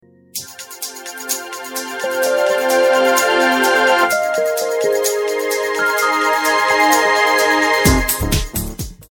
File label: transition